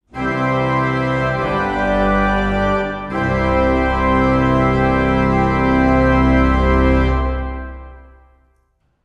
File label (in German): Fortissimo